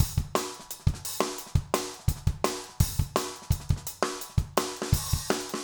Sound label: jungle 170